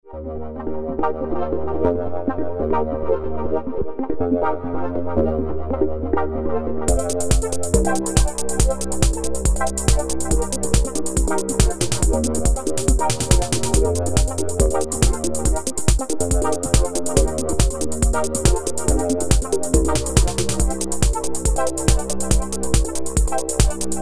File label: dubby step